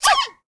sneeze, mob, panda; Minecraft

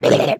shulker ambient; entity; Minecraft

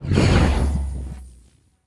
cast, mob, evocation illager; Minecraft

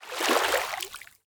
dolphin jump; mob; Minecraft

